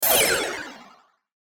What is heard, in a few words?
button sweeper descend; Multimedia; Blastwave